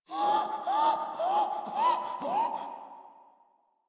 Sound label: Infested Runner Breathe Quick; Warframe; Runners